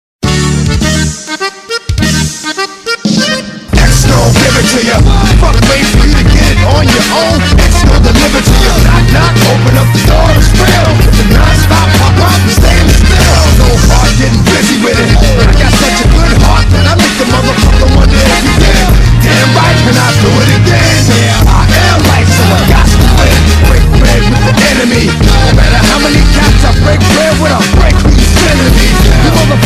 Polka

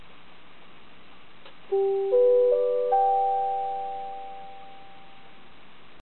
chime

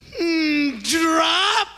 Mmmm drop

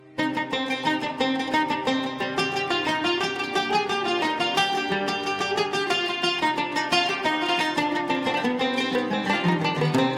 Rap

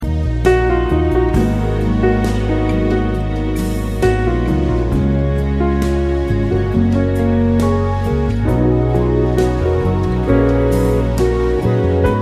Romantic

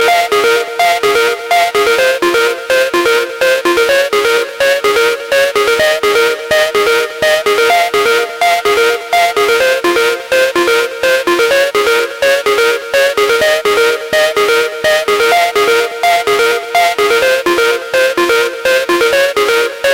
Best Trance Effect

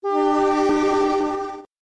horn; Trainz

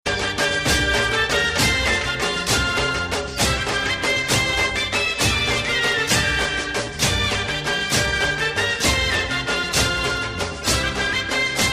Garba Gujarati

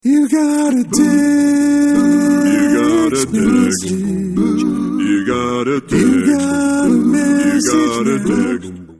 Doo Wap Text Sms

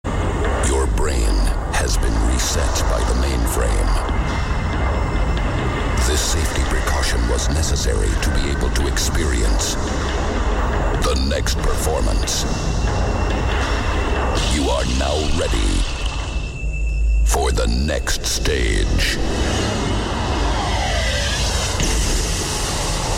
Reset

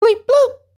Blee blop

